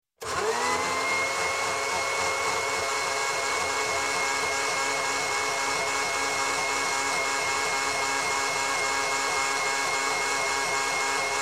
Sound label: Blender, Electric Start, Run, Stop, Liquid; Sound Ideas 2000 Series